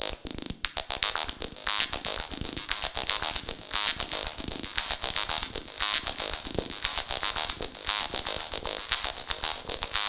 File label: Acid, Freak; microfreak; quasi KRELL patches on the miniFreak